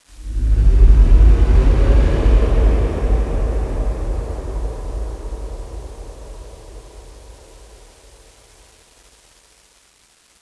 light power on sweep; XDM; buttons

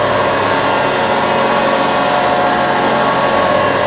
30kW 50Hz motor on; XDM; ambience

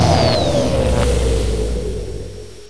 egon off, weapons; XDM

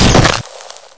hornet hit; XDM

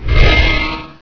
tele out; valve; TheBadPlace; teleout alt

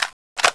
shotgun select; XDM

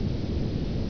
low roar; valve; cloister

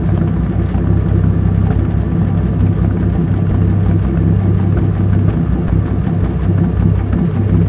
diesel loop, vehicles; XDM

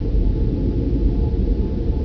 cave, ambience; XDM

